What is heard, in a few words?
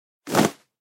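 sounds of waffle towel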